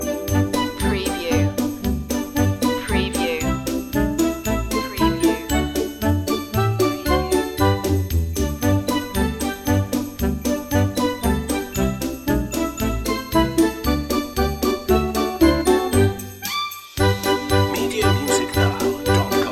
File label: jingle bells loop